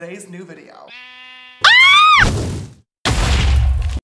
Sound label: minecraft ghast; james charles